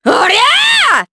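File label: Vox Attack Japanese; Nicky; kingsraid; voices heroes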